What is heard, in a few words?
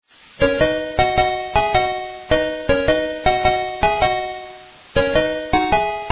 Irish jig